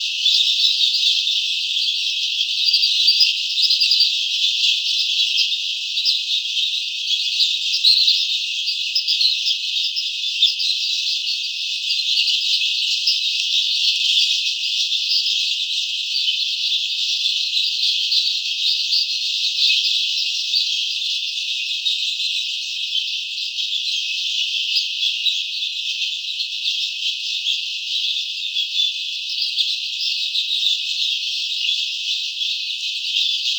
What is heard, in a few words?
bats hang en cave partial ultra sonic, Vietnam Bats w/ Ultrasonic (Hang En Cave); field recordings